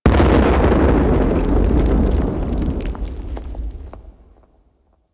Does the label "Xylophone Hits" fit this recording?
No